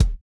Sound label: GLASS; Spectrasonics; Metamorphosis; GLASSBABY